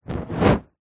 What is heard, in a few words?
nature fire flame torch whoosh air past